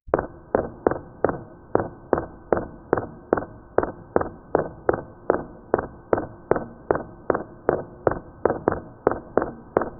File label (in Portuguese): um passo em uma dungeon, pixel art